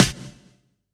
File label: Elec Snr, drum